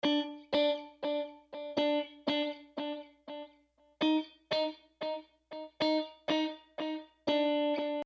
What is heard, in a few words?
guitar